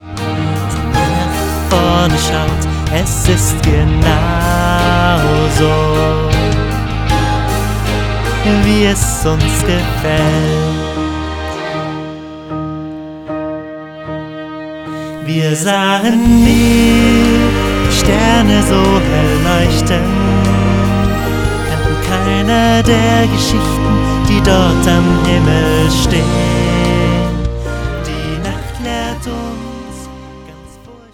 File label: Musical Pop Ballad